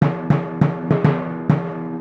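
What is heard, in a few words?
100 timpani, A minor; PowerFX - Downtown Orchestra; Orchestral arrangements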